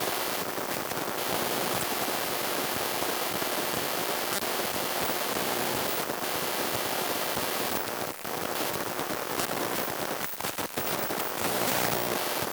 Circuits Electric Hum Buzz Noisy Digital Crackling